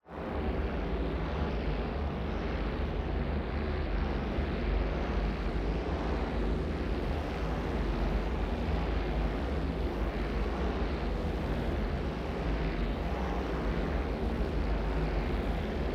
AMBIENCE Steady Buzzing Force; boom library; Matter

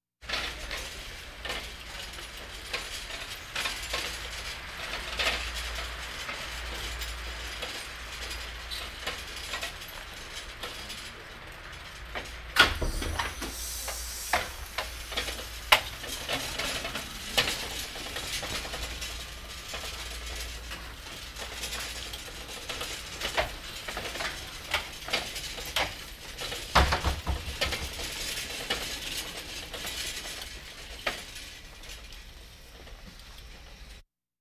Hospital effects

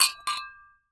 Hit Glass Jar Clink; SFX Kit; Foley - Misc

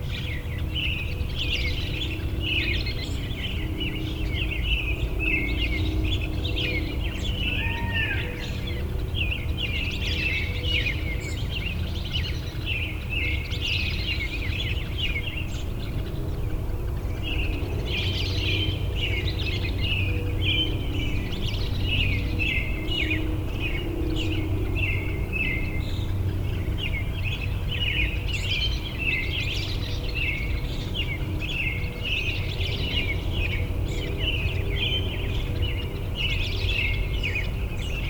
Suburban park morning bird chorus busy; urban and suburban; boom library

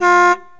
69 Mustang Horn; Automobiles

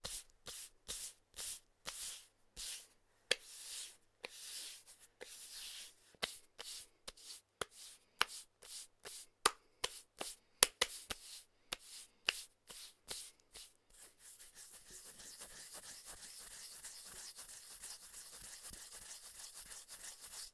brushing and scratching body; human; NS Studios - ultra sound collection

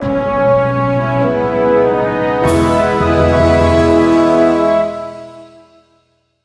Music Logo Sting; Menu FX

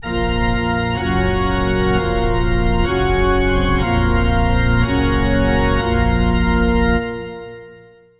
Music Funeral March; SFX Kit; Foley - Misc

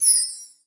Menu Magic

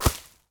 Street Ball Hit Hay; SFX Kit

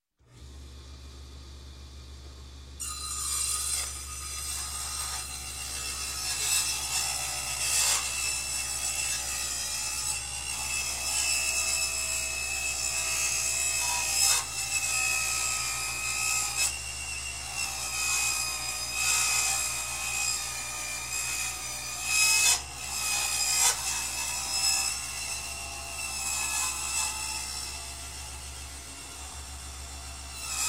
Construction, Saw Table Saw Start, Rip Wood; Sound Ideas 2000 Series

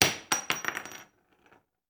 Bullet Shell; Sound Ideas; Guns

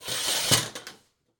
Morgue Curtain Close; Medical; Blastwave

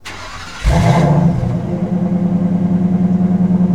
Truck Ford V10 Start Loop; Trucks

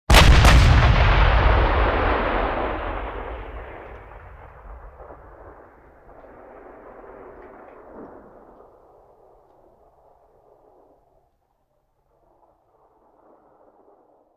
Artillery Cannon Artillery; Sound Ideas; Guns

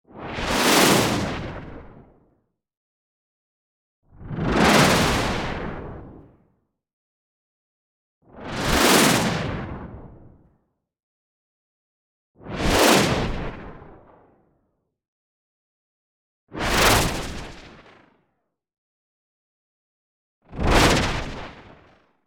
WHOOSH Pink Noise Flutter Filter; boom library; Anime Essentials

